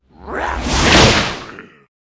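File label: attack, monster; mutroop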